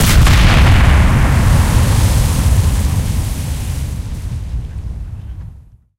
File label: torpedo explosion